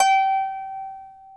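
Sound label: harp G4, Elizabethan harp; InVision Interactive; Lightware VOL-1; Instruments & Percussions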